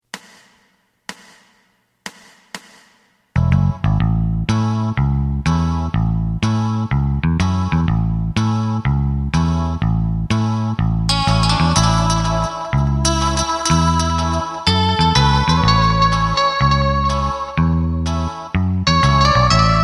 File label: drum